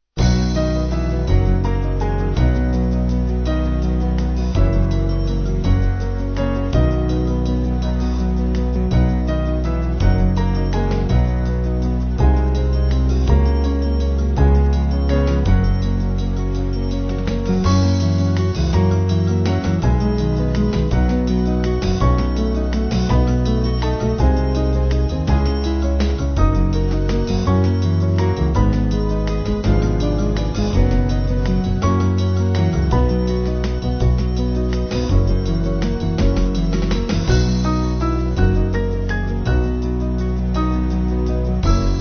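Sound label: Band, Free Music Backing Files for Churches; Tell Me The Stories; Challinor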